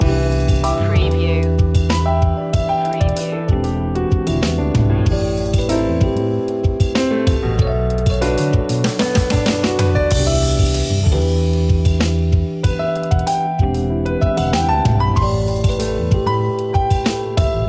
jazzy night loop long; media music now

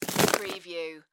Rope Set Down Heavy; sound effects bible

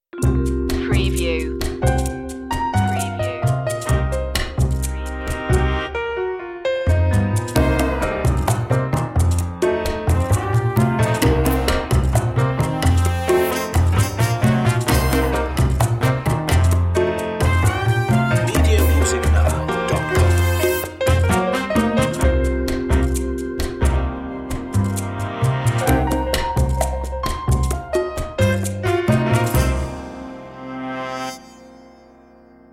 jazzy opener

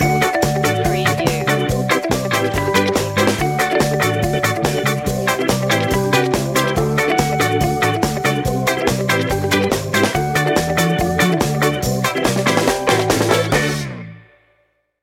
stomp

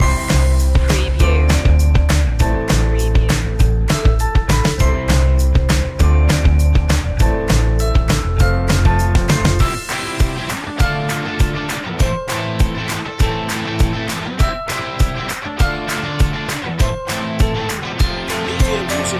upbeat rock loop

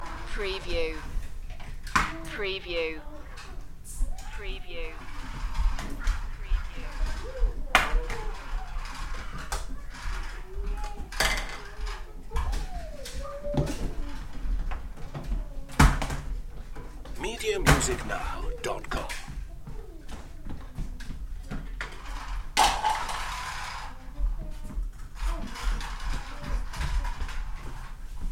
Kids Play Toys

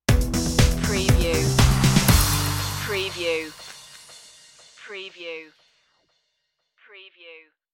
elements stinger

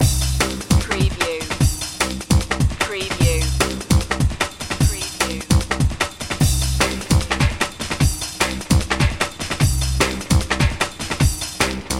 aviation loop

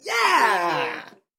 Man Yaah

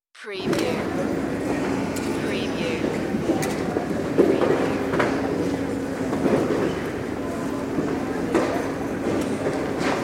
Arprt Scrty

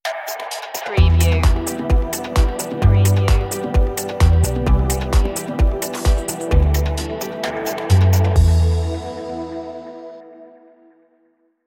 airscape stinger

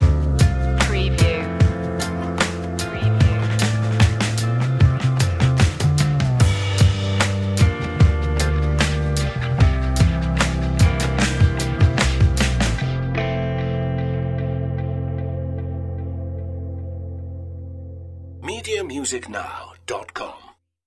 chill cafe; 20s

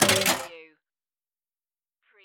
Pinball sound, chunky rattling

